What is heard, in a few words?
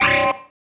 Stab, Organ, One Shots